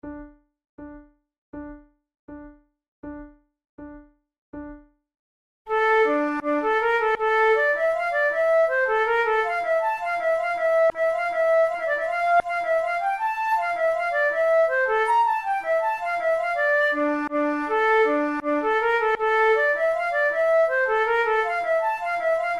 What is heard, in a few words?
duet, flute